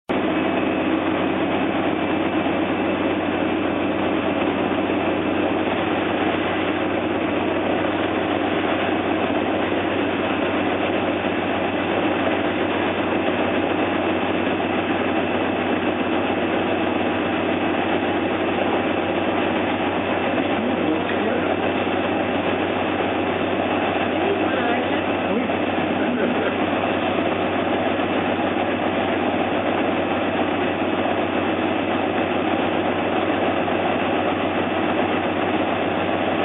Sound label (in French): machine; LESSIVE